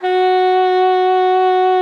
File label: F#4, alto sax; Xsample VOL-2